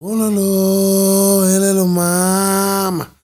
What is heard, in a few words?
MALE SOLO, Heart of Africa